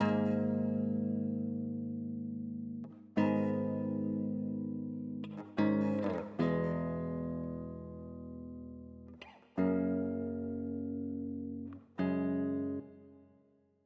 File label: Rhythm Guitar